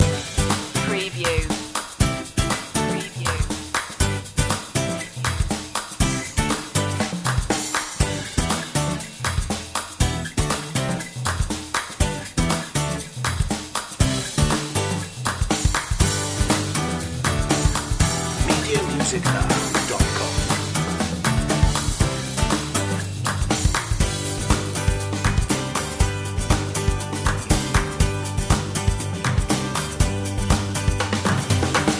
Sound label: another way loop